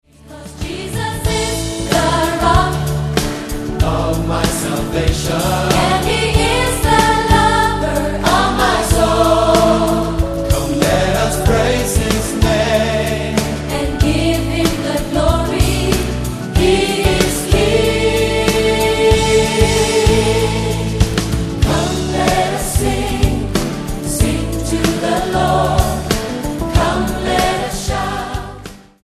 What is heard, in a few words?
come let us sing, gospel choir